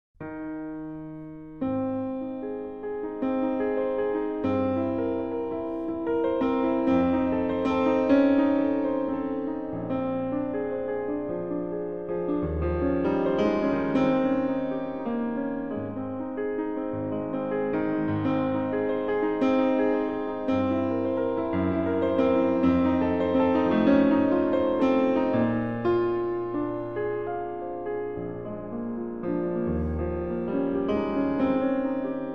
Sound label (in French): piano; liszt; reve d amour